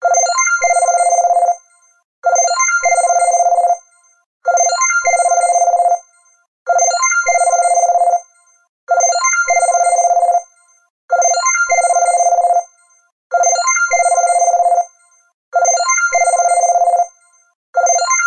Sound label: Soft Tone, ringtones; LG Optimus L5 E612